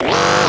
inserter long handed; factorio 2.0.55

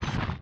drill inventory pickup; factorio 2.0.55

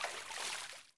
small splash; factorio 2.0.55

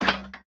artillery inventory pickup; factorio 2.0.55

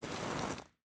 explosive inventory move; factorio 2.0.55

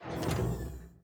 gate close; factorio 2.0.55

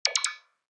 construction robot; factorio 2.0.55

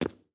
refined concrete, walking; factorio 2.0.55

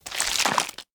small gore; factorio 2.0.55

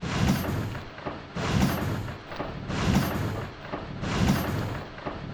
pumpjack; factorio 2.0.55

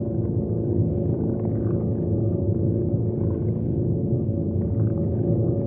nuclear reactor; factorio 2.0.55

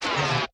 exoskeleton inventory move; factorio 2.0.55

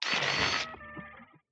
robot repair; factorio 2.0.55

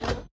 mechanical inventory move; factorio 2.0.55

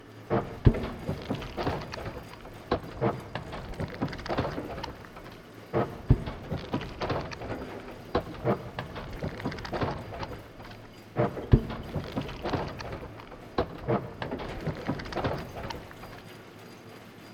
pumpjack; factorio 2.0.55

